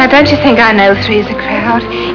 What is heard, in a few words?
Crowd